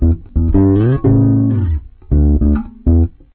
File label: game over; towergame